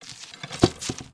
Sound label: arrow return to quiver, Medieval Weapons Sound Effects